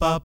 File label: "BAP" E3, Vocal Jazz Fx